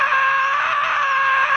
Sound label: scream